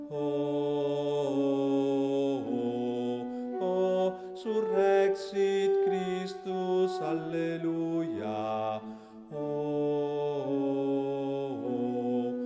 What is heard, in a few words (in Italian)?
BASSI; Quaresima e Pasqua 2020